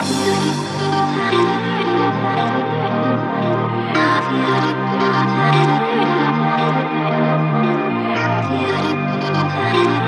electro dance

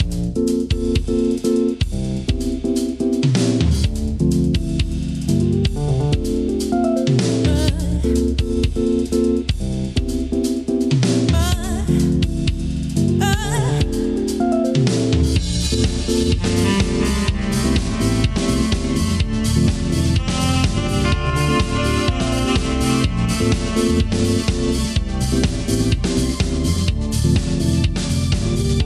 Chillout Funk Fusion

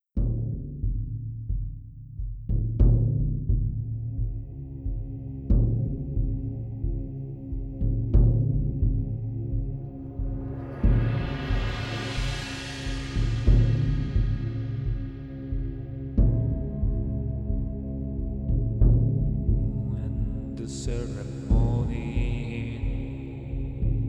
flute stories, 432 Hz

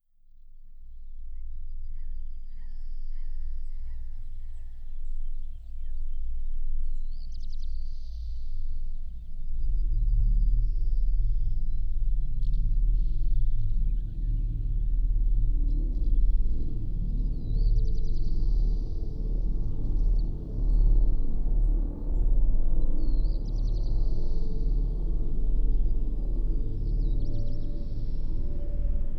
flute stories, 432 hz

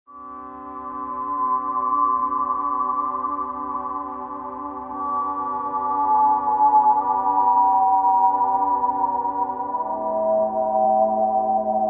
magiczna muzyka magic sound, 432 Hz

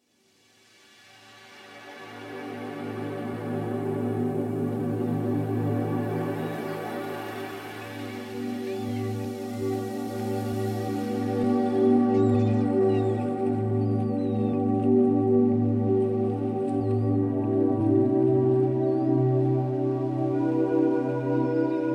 432 hz

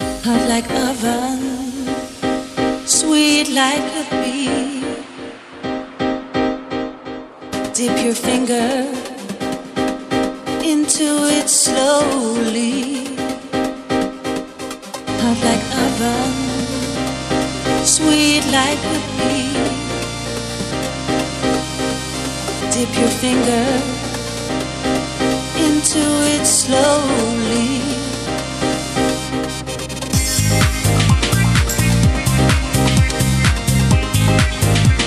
disco music 432 HZ

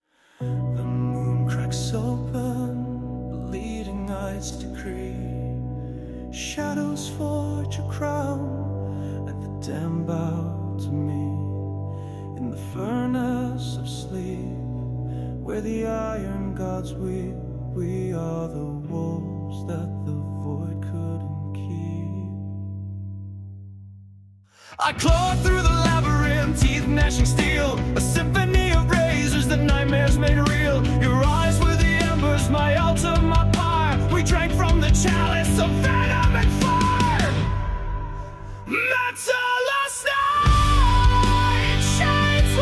Metal Ballad; shadows lie

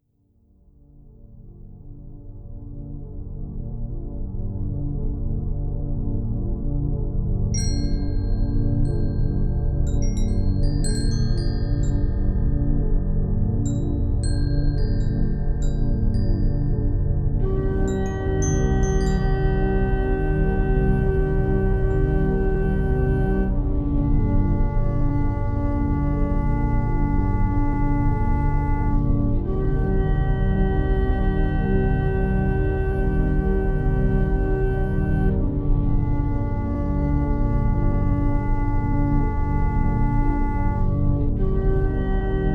flute 432 hz; rimas passion

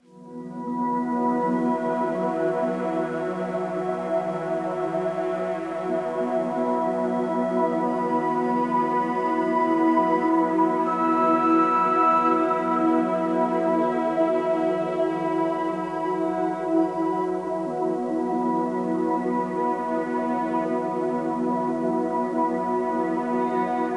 Tranquil Vibes

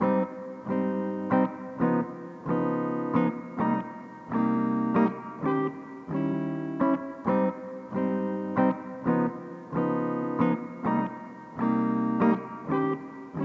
night lounge 432 Hz, Smooth Jazz, czy też lounge

